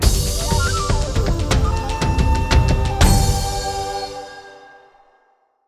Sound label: large Win; netent